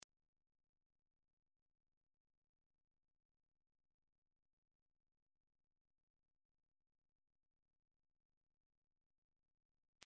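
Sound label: ultrasound 17000 Hz; slyshat do 24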